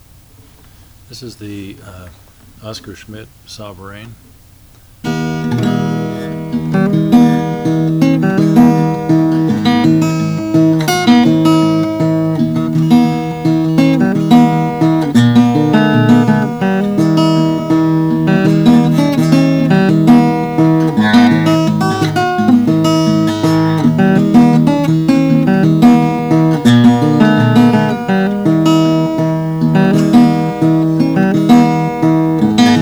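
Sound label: oscar schmidt stella sovereign natural, Guitars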